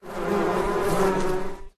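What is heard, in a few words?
wasp dodge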